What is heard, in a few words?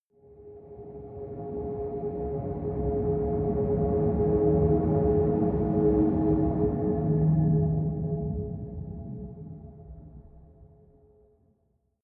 train locomotive drive by distant